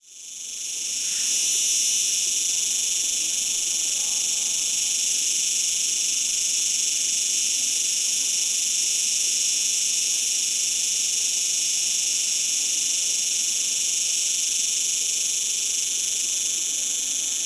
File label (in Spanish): chicharras